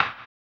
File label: HAMBONE, Vocal Blues Soul; Spectrasonics; Planet